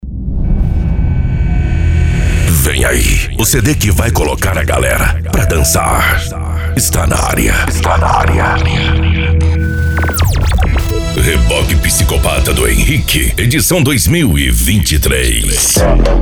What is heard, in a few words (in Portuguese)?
eletro funk